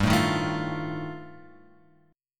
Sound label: G 7th Suspended 2nd Sharp 5th G; G7sus2#5 Chord {3 0 1 2 X 1} strummed